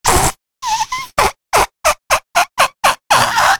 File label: balloon deflate air fast stutter stretch lip; foley; zapsplat